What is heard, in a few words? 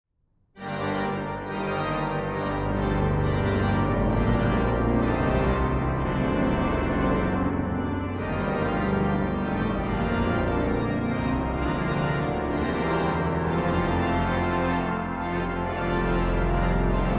Trailer Symphonic Thunder